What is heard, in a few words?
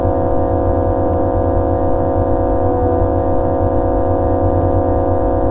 riot hum; ambience; caged